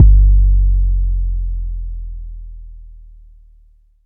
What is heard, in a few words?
phonk kicks oneshot 808 G; trap drums